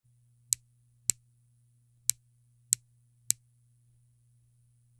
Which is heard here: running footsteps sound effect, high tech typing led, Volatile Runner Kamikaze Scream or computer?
high tech typing led